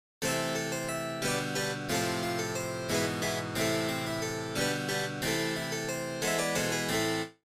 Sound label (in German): melodei